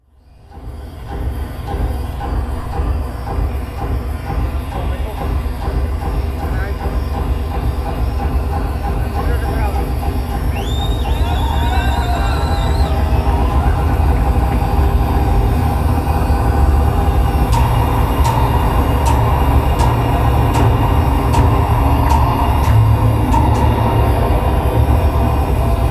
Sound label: Drum Solo